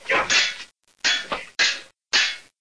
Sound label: Gladiators Fighting